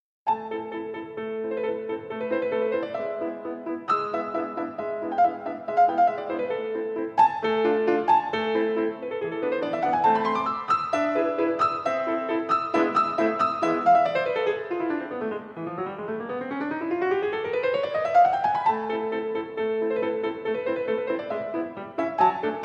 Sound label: Sonatina, Sonatinas For Piano